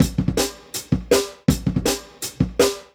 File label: Jungle Breaks; Original Jungle Breaks